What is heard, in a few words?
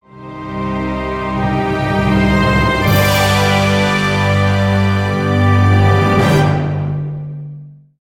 Ui Maintheme Success Short; darkstar one; windows xbox 360